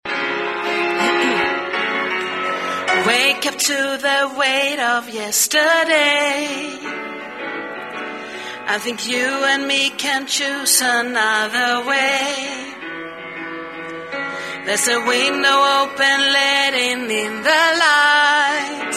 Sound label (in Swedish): sopran